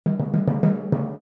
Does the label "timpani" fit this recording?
Yes